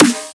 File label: Snare